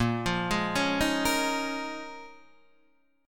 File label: Bb Minor 11th Bb down Guitar DADGAD